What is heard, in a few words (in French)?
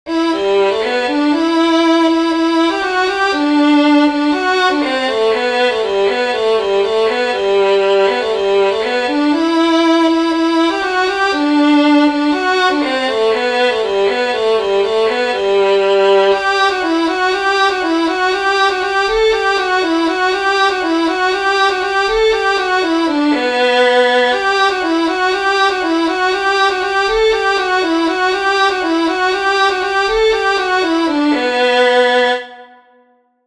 bourree violon; bourree d aurore sand